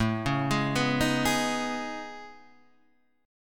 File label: A Major Sharp 11th, Guitar, Standard; AM#11; arpeggiated; JGuitar